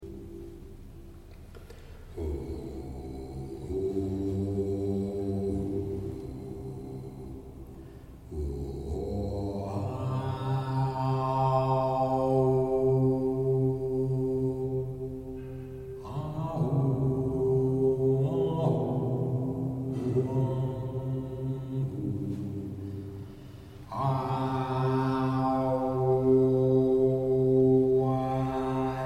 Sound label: Singing